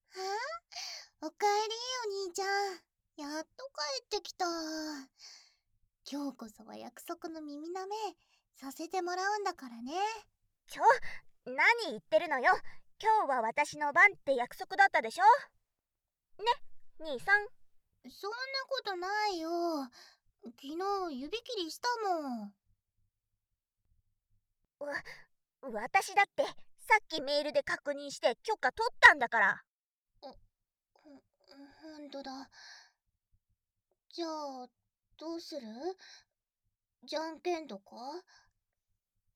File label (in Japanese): 姉妹の取り合い耳舐め 効果音なし, ASMR